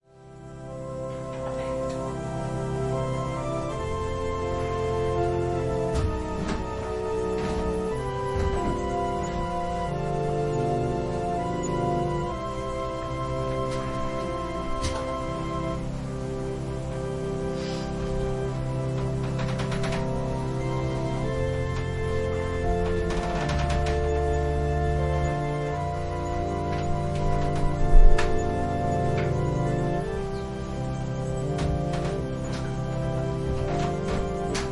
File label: Organ prelude; Jesus only